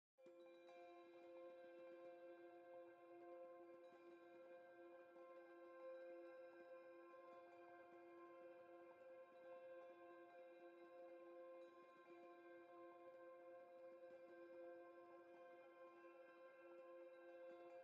tonal Amb Bell Spiritual F

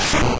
Jump Kick; sfx; attack moves; pokemon; gen 1; rby

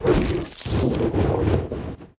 Kill Player

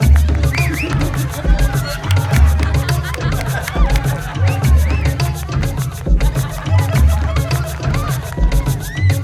Puppet Dancing Music

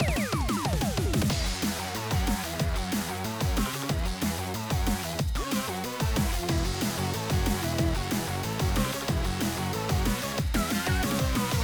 BGM Versus Fes Thunder

